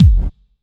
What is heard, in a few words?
Kicks; Vengeance Samples